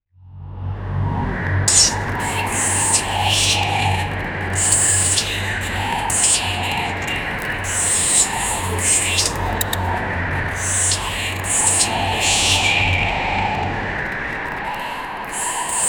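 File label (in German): dramatische düstere demoni, demon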